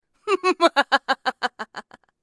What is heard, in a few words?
laugh; anti laugh; Marble Maid